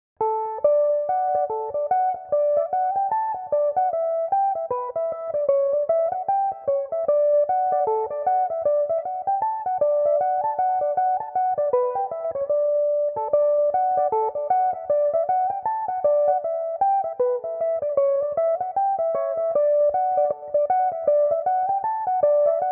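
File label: D major, Irish mandolin; Belmuse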